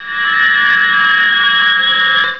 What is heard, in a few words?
ICE TING